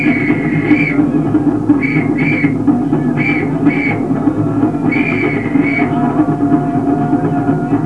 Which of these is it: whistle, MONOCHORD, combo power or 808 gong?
whistle